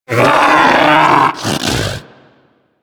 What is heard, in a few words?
Predator Vocal Death; Warframe; Hunting Critters